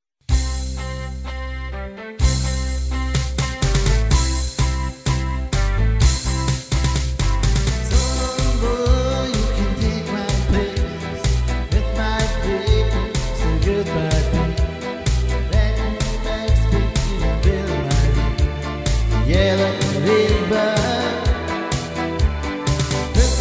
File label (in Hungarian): tanc